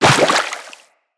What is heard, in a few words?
Walk Water, Footsteps; Operator